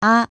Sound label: 15k Tap 44100Hz